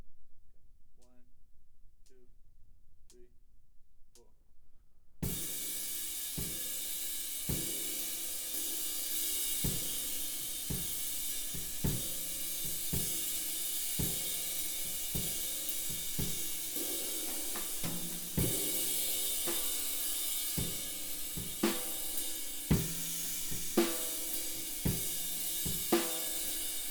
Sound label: Kit